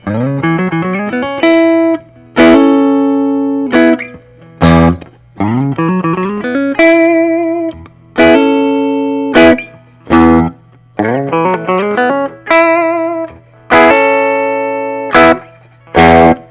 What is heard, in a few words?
collings i 35 tobacco sunburst